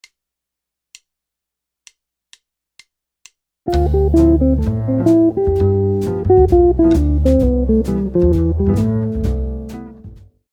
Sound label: lick, jazz guitar